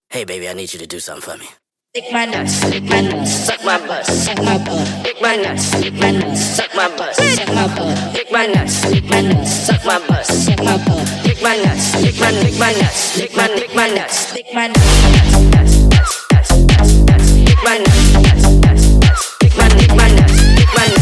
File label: SPED UP